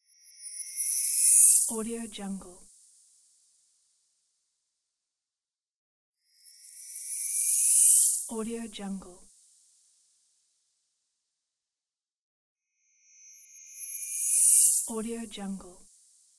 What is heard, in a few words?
reversed shine; gamechestaudio